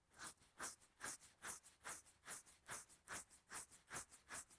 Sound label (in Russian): zvuk chesatsja